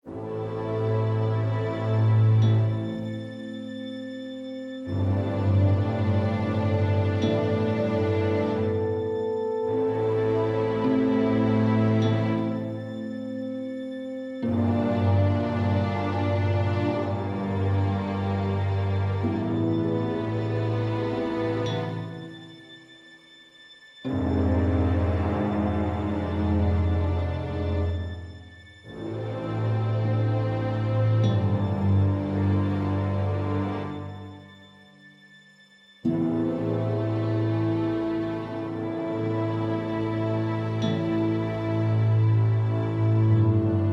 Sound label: Loop Caution; Game of Thrones: A Telltale Games Series